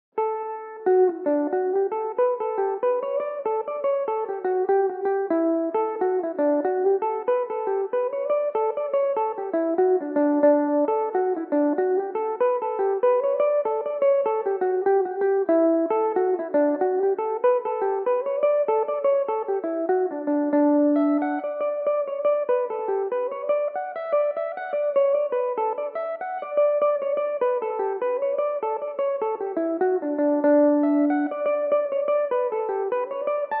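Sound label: jig D major belmuse, irish mandolin; The Glenshesk Jig; Belmuse EM-190 electric mandolin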